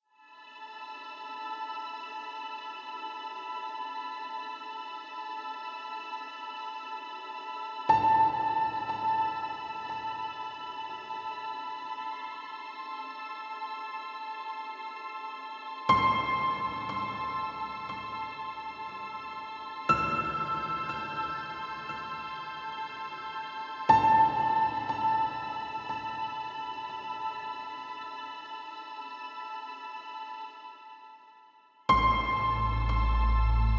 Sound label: Icy Strings; rime cue for “pre-murder” scenes. Features “icy” dissonant strings, high piano, low drones and a light climax.